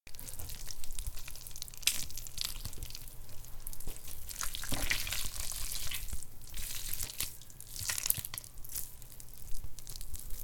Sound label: Ooze Squelching chicken carcass mangling; SyrinScape; East Ward